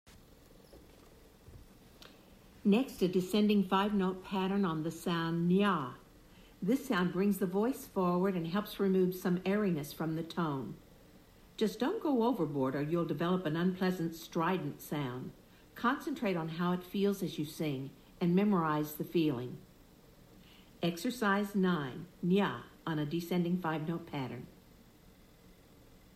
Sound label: Vocal Warmup Nyah